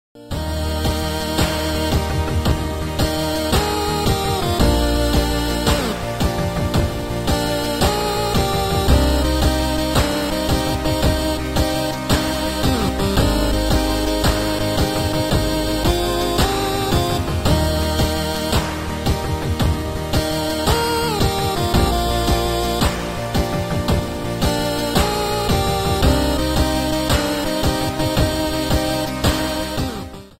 8 Bit, tono de llamada

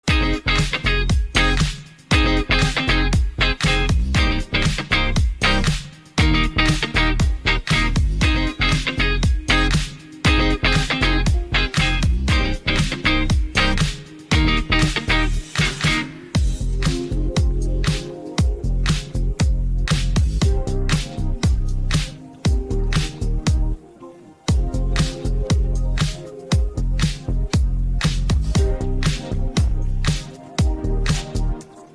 Agua Instrumental

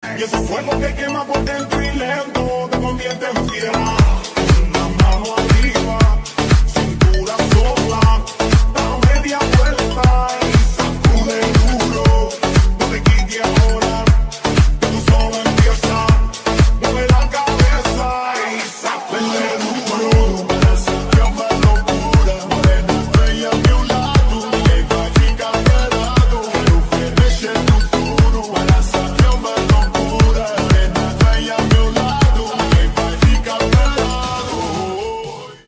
remix, tono de llamada

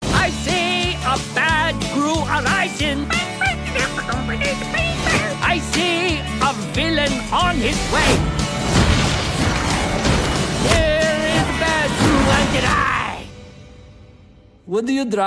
Singing; Young Gru

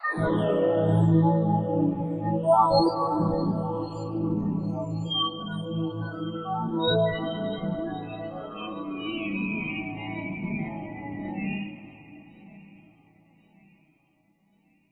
ambient

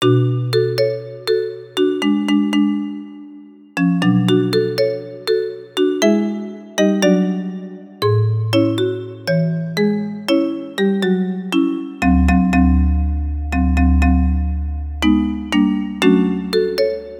Wii Music Marimba

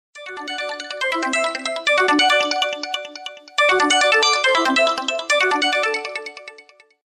Samsung S23 Water Drop

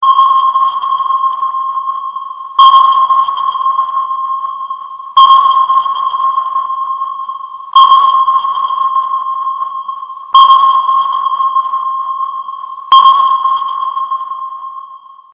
Submarine Sound; tono de llamada

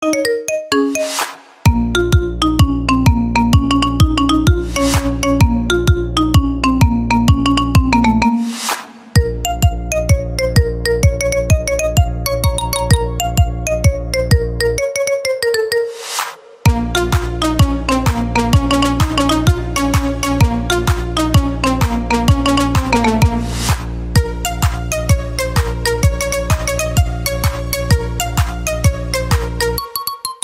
iPhone 12 Adios, tono de llamada

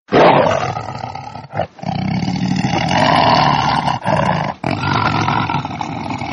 Roar Of A Tiger

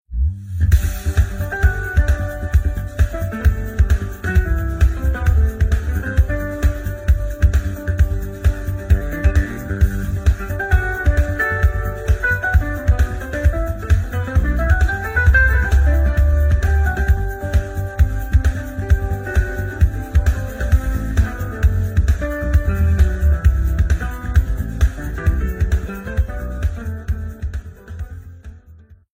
Samsung Galaxy Note 20 Ultra, tono de llamada

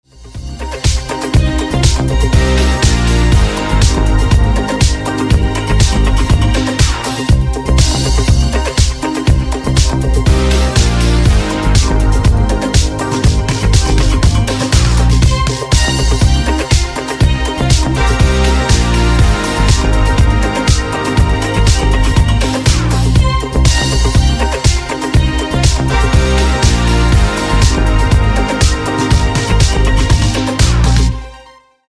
Instrumental; tono de llamada

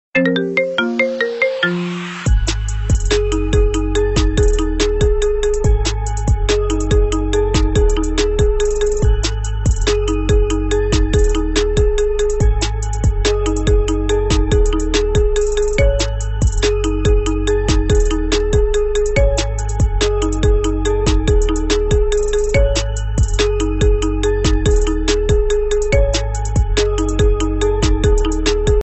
Marimba

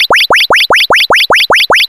Sms Warning Tone

